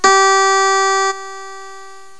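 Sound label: HARPSICHORD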